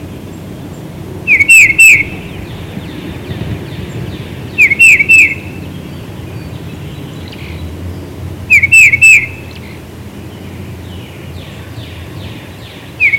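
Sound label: Tufted Titmouse peter peter peter series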